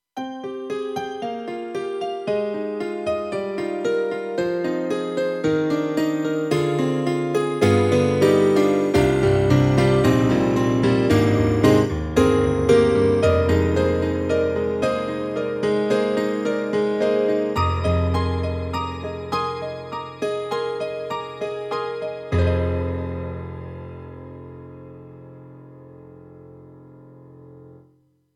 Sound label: Romance Piano Bell 2; M3 Version 1 Wave; KORG M3 XPanded